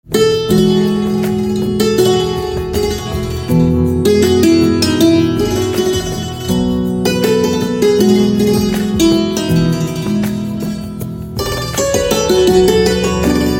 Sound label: Santoor